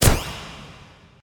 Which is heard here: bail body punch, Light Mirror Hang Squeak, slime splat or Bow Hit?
Bow Hit